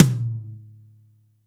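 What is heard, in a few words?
TOM loud; Zero-G; Chemical Beats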